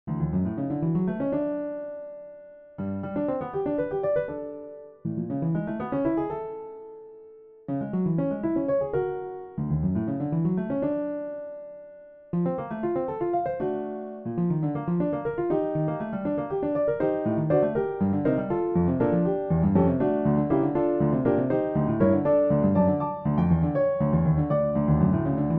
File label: Prelude Piano Impro